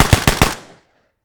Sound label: gun m3a1 grease gun single shots very sharp; prosoundfx